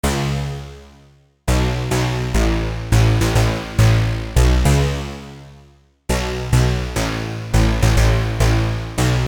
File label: pluck, Pluck Synth